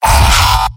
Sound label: m pain sharp; demoman; tf2; garrysmod; mvm